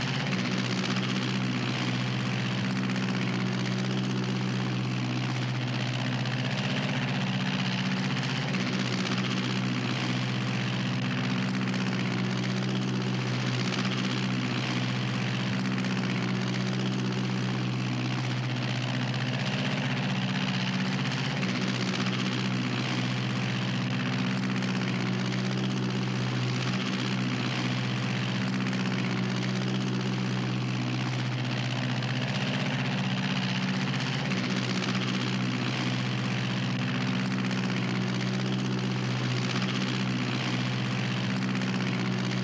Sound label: Plane Constant Flight; Monon; The 39 Steps